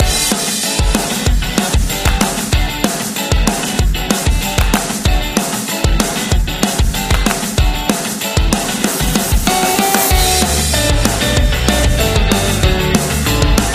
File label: Cheerful Life Indie Rock